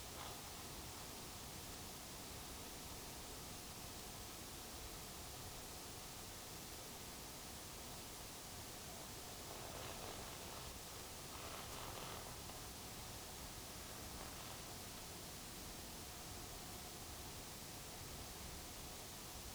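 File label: hydrophone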